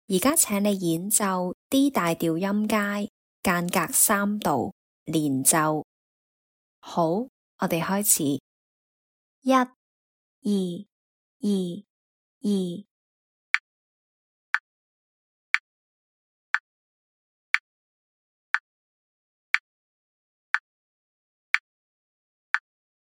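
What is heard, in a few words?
D major scale 3rd apart legato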